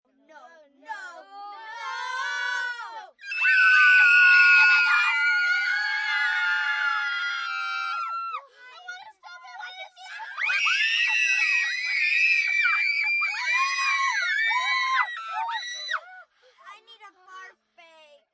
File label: Group No Scream, Scream, Group, 8-10 children, distant, long, roller-coaster, kids; males, females, girls, boys, human voice, some words